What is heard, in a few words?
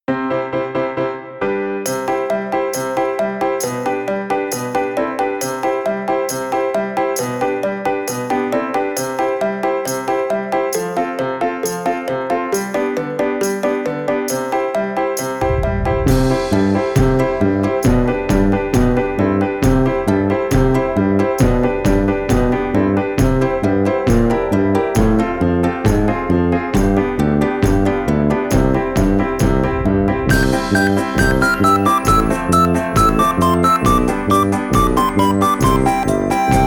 Off Vocal